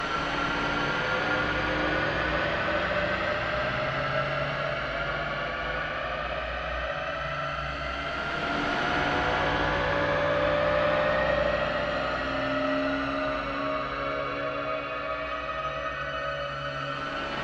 Synth 110

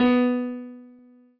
Piano Ff

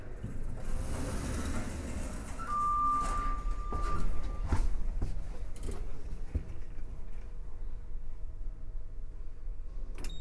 Elevator Sounds

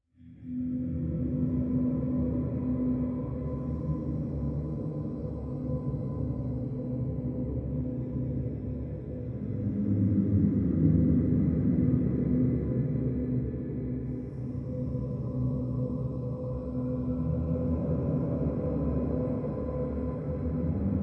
Ghostly Voices Atmos

